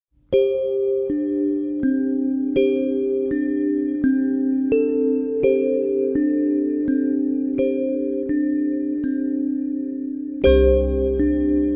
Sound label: calm ringtone; minecraft